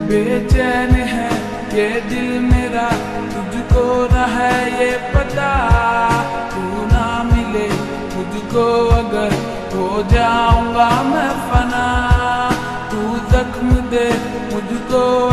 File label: Lofi Ringtone